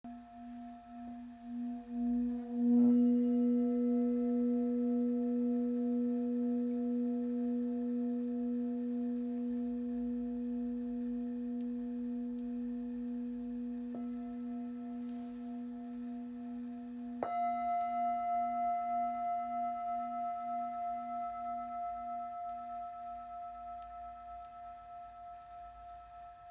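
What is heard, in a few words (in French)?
Bol full moon la diese, bol chantant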